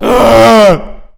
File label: Zombie Throw